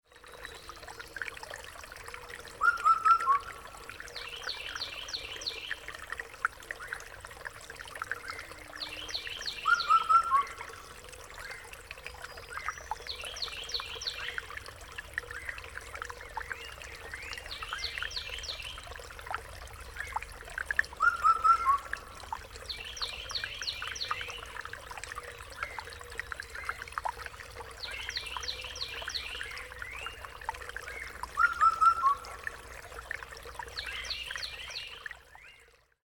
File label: Mountain Stream